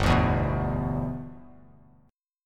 G 6th; G6 strummed

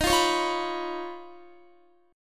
Eb 7th Suspended 2nd Sharp 5th Eb, Guitar; x,18,17,18,17,19; Eb7sus2#5 strummed; JGuitar

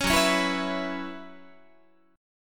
Gb 7th Suspended 4th Gb down Guitar, chord

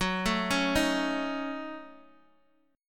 F sharp Minor 6th F sharp Guitar DADGAD

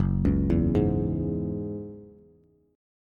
Gb 7th Suspended 2nd Gb Bass, Gb7sus2 Chord; arpeggiated; JGuitar

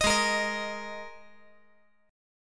G sharp Suspended 2nd, G sharp, down; G#sus2; strummed; JGuitar

